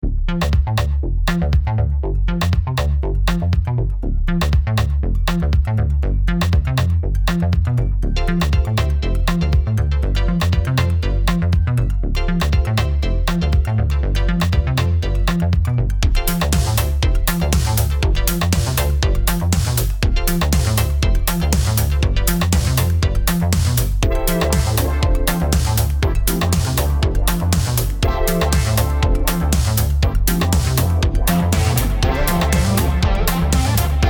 Nonlinear Labs C15 Linn Funk

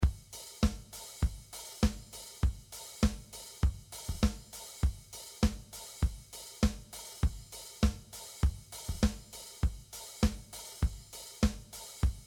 museq warm

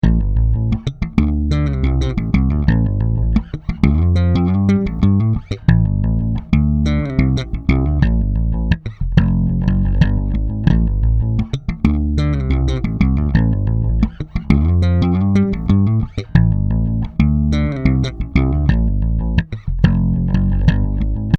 staccato; tc electronic

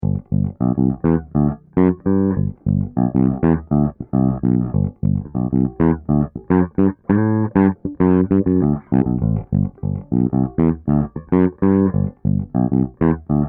wtube

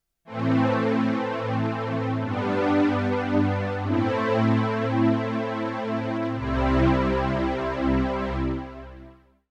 DRP 20 Analog Pad Dry

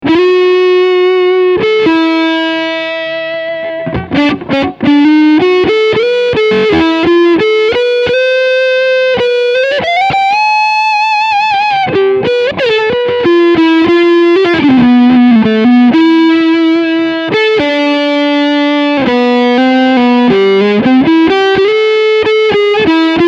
Overdrive Front PU

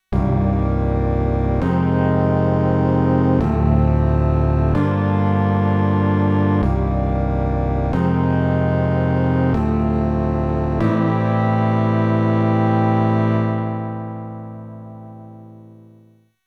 Modal Craft Synth 2.0

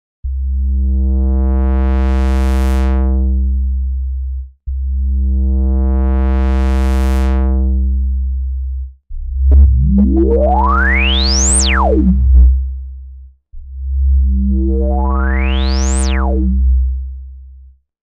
filter

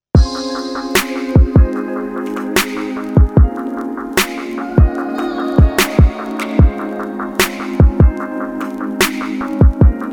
Radio RnB

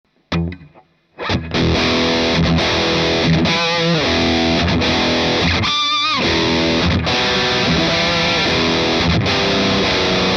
HB HI Gain

